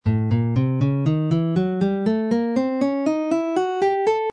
a dominant diminished scale fifth and sixth string tonics alternate pattern, guitar